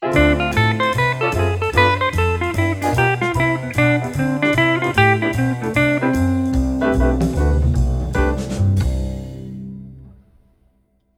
ii V I Modal Lick, guitar

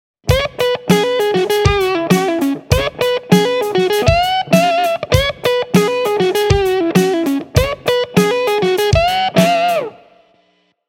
Rhythmic Rock Riff in E

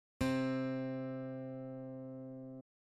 intervals harmonic octave, guitar

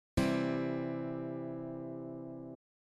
C major; guitar

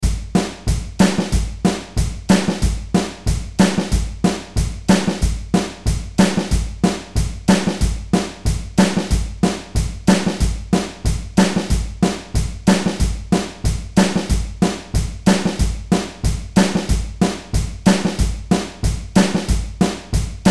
Rock Solo Drums

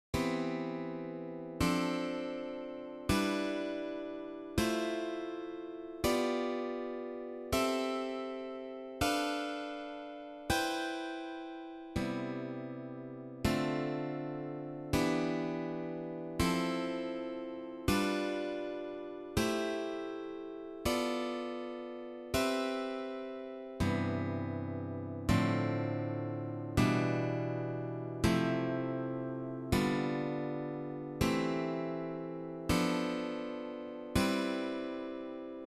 seventh chords practice, guitar

